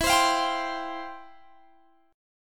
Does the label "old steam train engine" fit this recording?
No